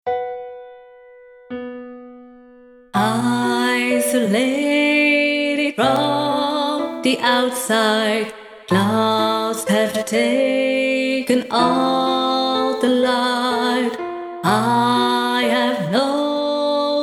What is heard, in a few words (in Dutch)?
Birds sopraan